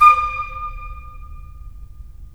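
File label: FL, flute; LA Composer vol. 3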